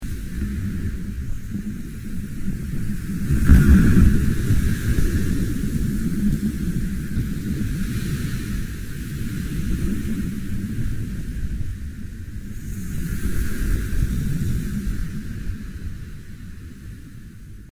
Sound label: More eerie ocean breeze